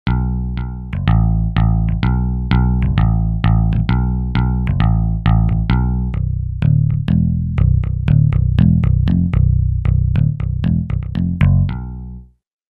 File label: RockOut Bass, Roland JV-880 Factory Patches